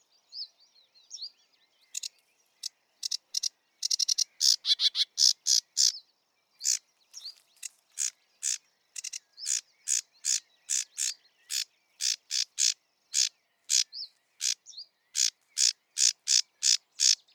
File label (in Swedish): Sävsångare